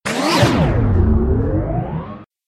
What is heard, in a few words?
bomb defused sound